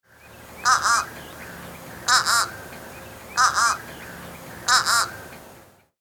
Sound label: Fish Crow Double note call; Rabenvogel